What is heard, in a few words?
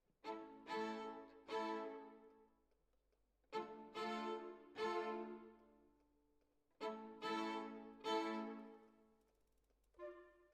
Violin Solo